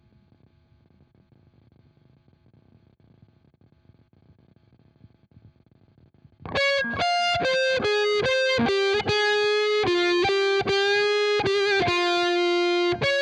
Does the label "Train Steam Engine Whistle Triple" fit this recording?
No